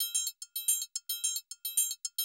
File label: Classic DrumLoops, 110